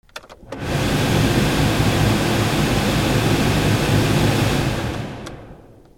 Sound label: CARS